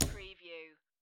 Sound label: Poker Chips Place One Chip Onto Stack Of Chips Wood Table